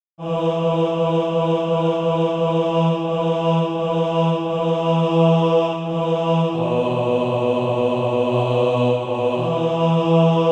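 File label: bass; Do You See the Hebrew Captive Kneeling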